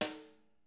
snare, Interactive Drum Kits; Sonic Reality

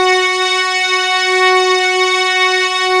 OMNI REEDS, ARP Synths, Analog Odyssey; E-MU Formula 4000 Series Vol. 3; ARP Synths II

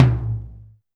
tom lo, Alesis DM5

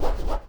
rush; Spectrasonics; Liquid Grooves; RUSH SIGN

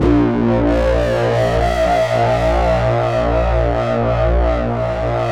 SYN LO RE; AMG - Now CD-ROM (Roland); Pure Gold

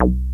303 PNC, BASSES; Pro-Rec - Synthsonic

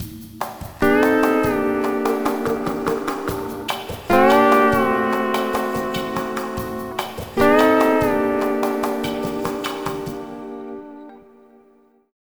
Dark Para Full G, Lounge, 146; Zero-G Kocktail Kollection; Construction Kitz

